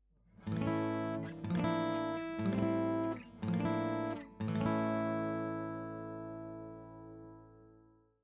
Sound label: A Chord Ascending, guitar